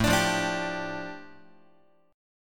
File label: A 7th Suspended 4th Sharp 5th, A, down, Guitar; A7sus4#5 strummed